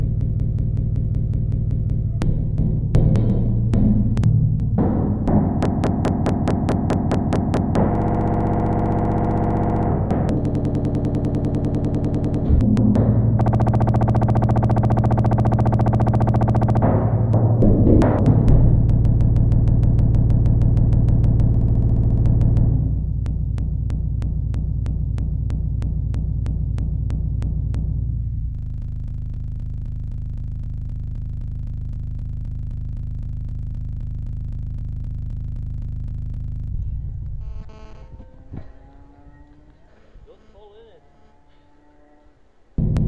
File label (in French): enregistrement tube loop